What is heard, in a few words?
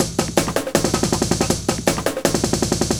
rumble 160